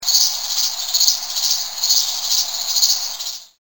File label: midnight crickets